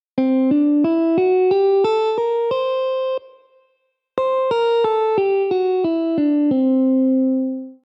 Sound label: Lydian Dominant; scales